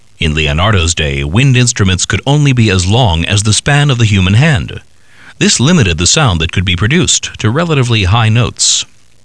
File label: FLUTE